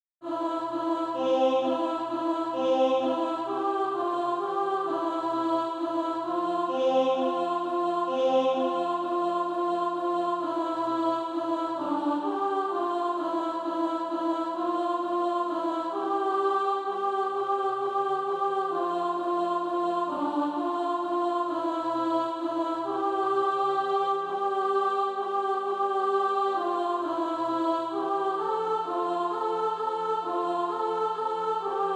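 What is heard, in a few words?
alto, SATB Practise Music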